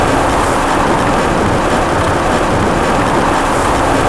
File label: gravel skid ext, Kart Tires; AOS RF1 Kart Demo